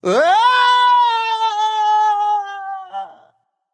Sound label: nv fear; smite; voicepack; ganesha